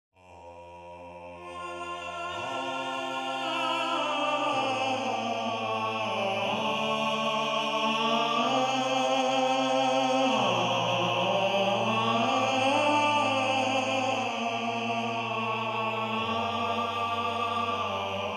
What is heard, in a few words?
counterpoint